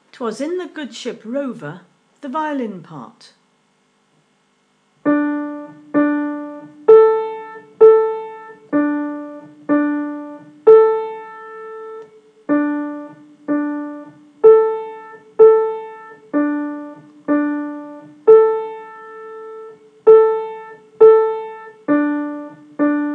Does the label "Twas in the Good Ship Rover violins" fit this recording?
Yes